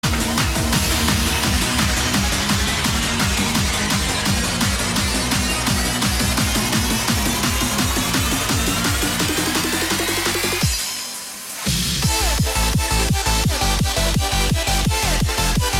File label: Instrumental